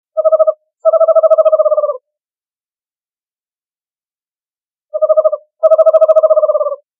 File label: screech, owl